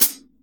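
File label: hi-hat, Drums Acoustic; AKAI; 90s sample CD; USB Soundscan vol.10; RAW KIT 8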